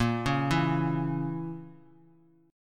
Bb Diminished Bb Bass Standard; arpeggiated; JGuitar

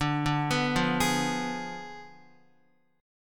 D Diminished 7th D down Guitar; Ddim7 arpeggiated

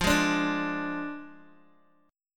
F Minor 6th F down Guitar Open G

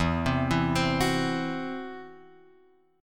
E Suspended 2nd Sharp 5th; Esus2#5 Chord {0 3 2 X 1 2} arpeggiated

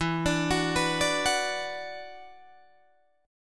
E 7th Suspended 2nd Guitar DADGAD; E7sus2; arpeggiated; 14,17,16,16,17,16

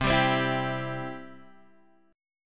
C Major; strummed; x,3,5,5,5,3; chord; Standard; JGuitar

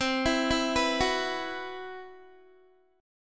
C Major 7th Flat 5th down Guitar; CM7b5 arpeggiated; JGuitar

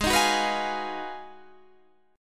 Ab Minor 13th, Ab, Guitar, Standard; Abm13 strummed; JGuitar

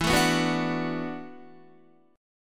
E 7th Suspended 2nd Suspended 4th, down, Guitar; E7sus2sus4 strummed; JGuitar

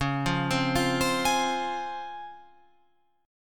Db Major 7th Db down Guitar Open F; arpeggiated

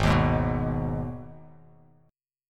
G sharp Minor, chord; 4,6,6,4,x,x,x,x

